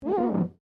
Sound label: GLASS SQUEAK FINGER